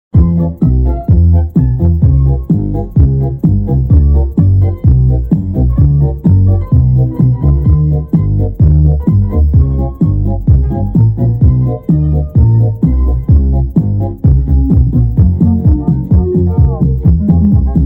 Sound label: bass